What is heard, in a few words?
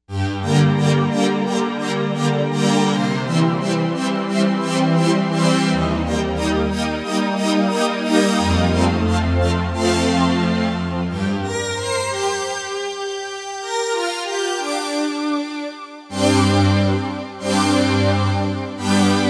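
Wah String Machine, Novation UltraNova; Factory Patches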